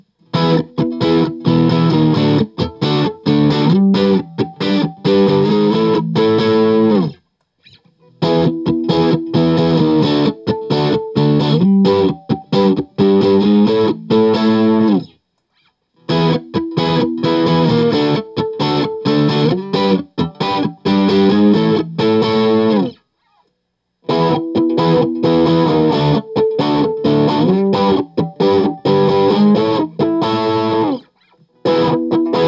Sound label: Guild S284 EMGs J800; Guild S284 Aviator with EMG pickups